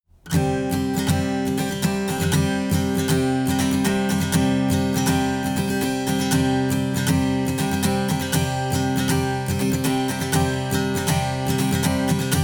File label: comparing direct ag; rme